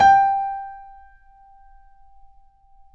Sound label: Mf G 4, Grand Piano off mic; AKAI S6000 CD-ROM - Volume 2